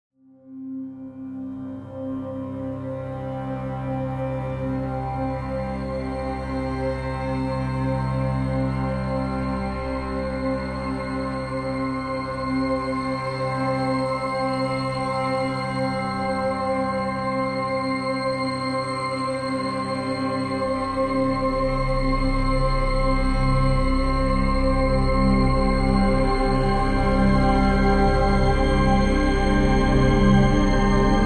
drone

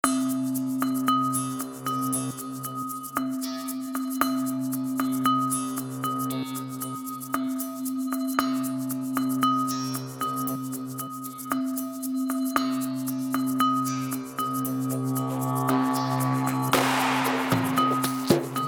tribal forest

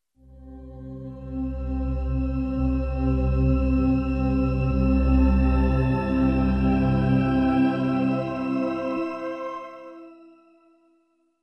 nap jingle

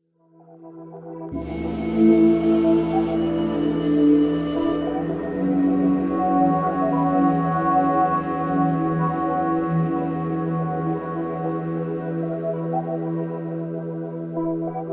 cosmic flute

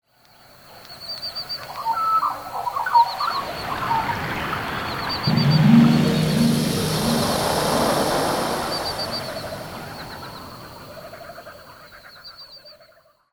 green forest jingle

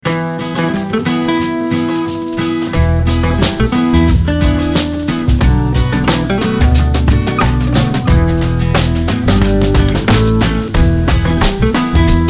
folk jingle

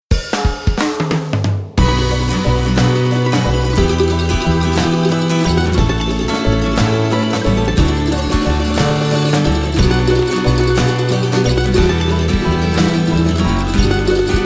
mandolinette

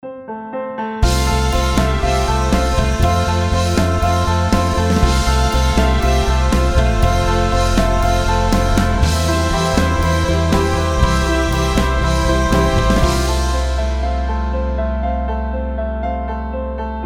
pop dream jingle

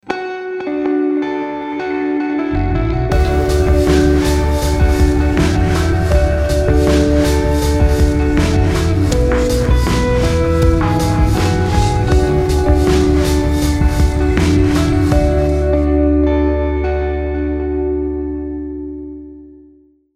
ignition jingle

